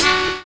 HIP SAX; Univers Sons

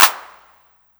CLAPS 808; E-MU Formula 4000 Series Vol. 3; Analog Odyssey; Electro Perc

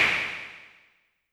Cymbal Synth; YAMAHA RM1X

